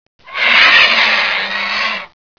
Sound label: pain, leviathan